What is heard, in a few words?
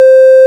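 ORG, Farfisa organ; Roland LCDP09 Keys of the 60s and 70s 1; Farfisa Clar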